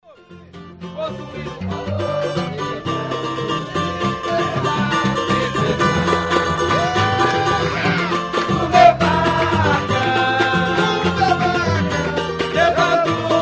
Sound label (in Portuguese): samba